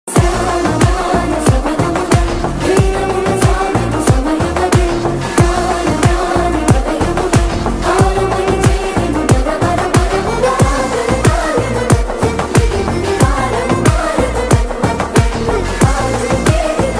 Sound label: trance; theme of bro; pawan kalyan; sai dharam tej